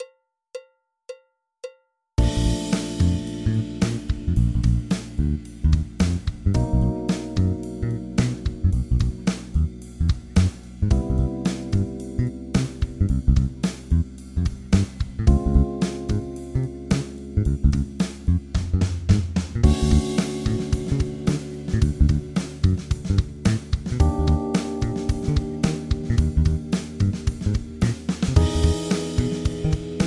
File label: MAJOR 110BPM, saxophone play along major; major scale and arpeggios; 2 BARS; saxophone masterclass; major improvisation